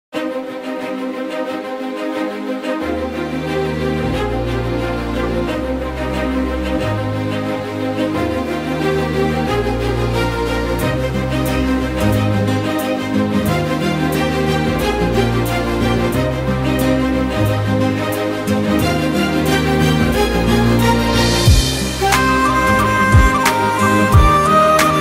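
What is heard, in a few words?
BG Music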